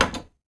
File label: button